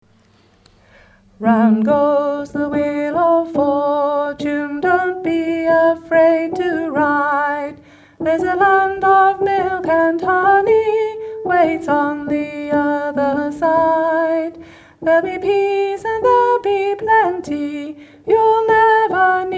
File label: Rolling Home Sops